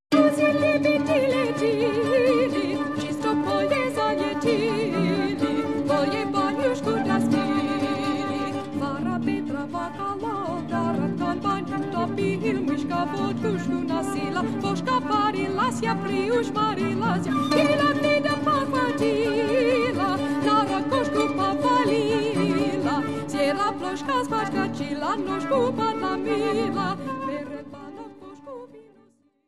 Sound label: Four Songs for Voice, Flute, Harp; chansons